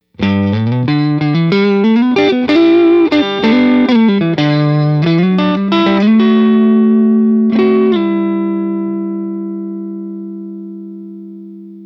'59 Strat Neck, pickups

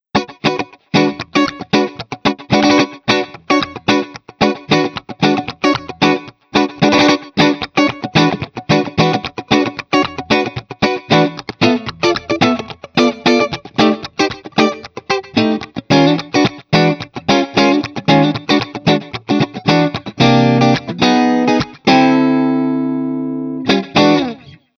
74 neck middle funk, pickups

